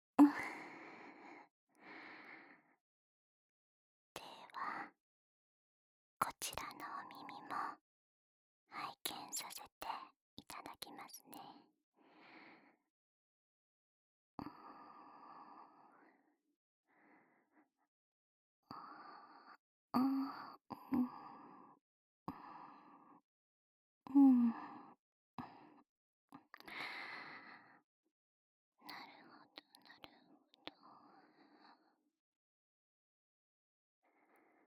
清洁左耳&舔耳; 这次是另一边…♪

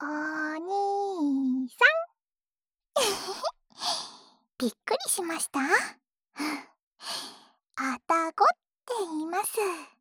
即兴舔耳（右耳）

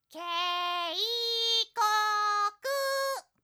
訊息 警示

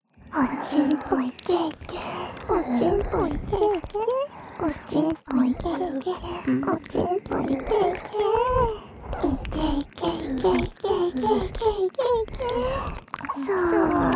射精吧～～♪＋雙耳舔舔; 附錄迴圈（自慰輔助）; 想被雌小鬼露比瞧不起！

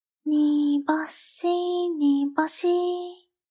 叫声

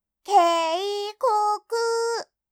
訊息 警示

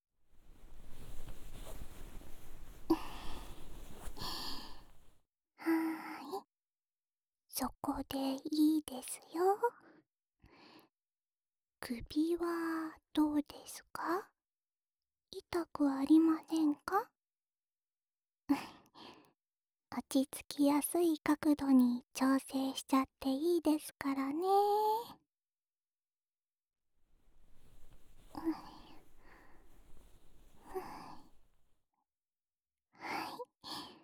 掏耳，左耳; 另一边的耳朵也要好好打扫干净♪; 稻丛的掏耳

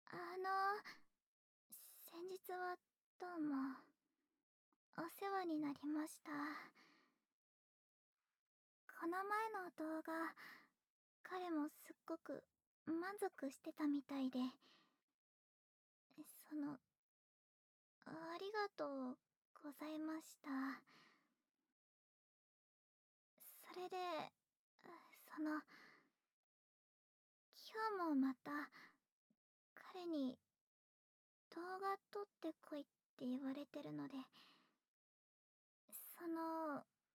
爱抚潮吹高潮; 大姐姐NTR爱爱视频

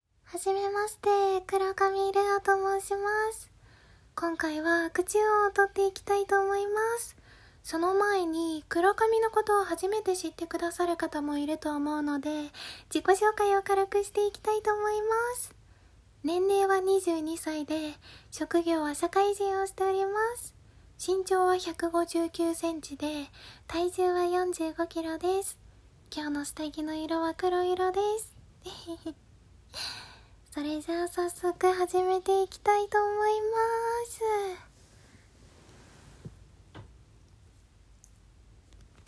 用手指发出咕啾水声; 无剪辑ver.

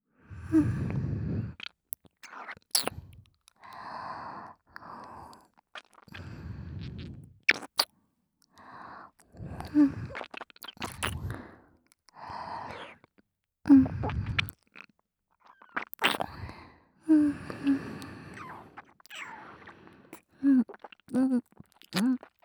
舒緩MIX單耳; 大腦錯誤

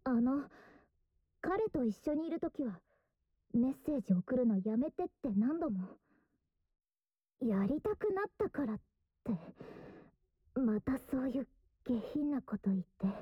饮精口交, 隔墙音效; 恋在隔壁房间的NTR饮精口交

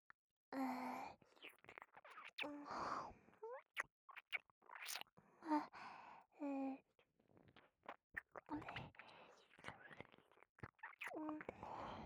舔耳 普通

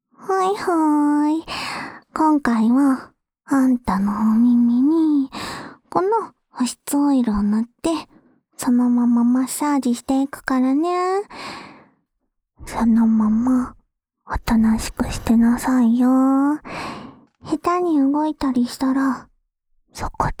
天詩的精油按摩 右耳, ASMR

